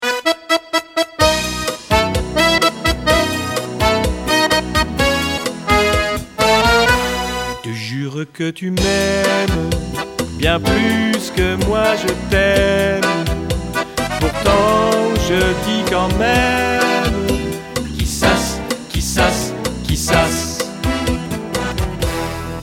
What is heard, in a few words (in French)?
trompette accordeon